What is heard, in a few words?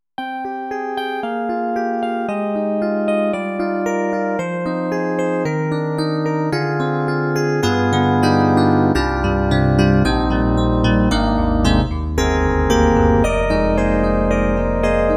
Solo FM Hard EP; SD-90; EDIROL